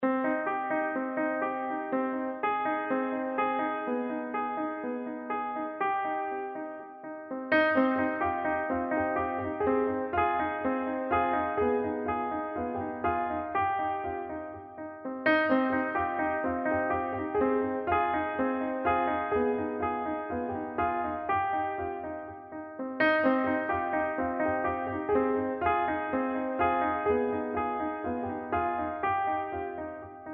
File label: Piano